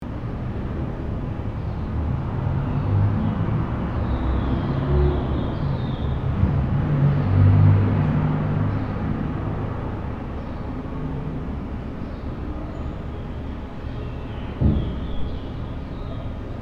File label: Parking garage ambience light vehicles moving loop